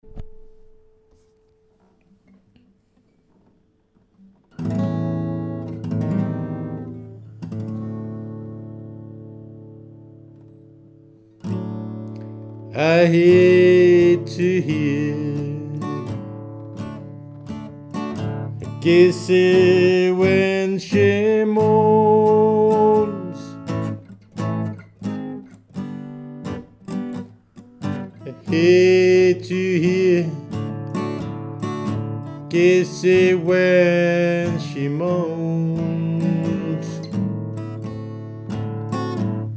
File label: moan